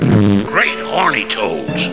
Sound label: fart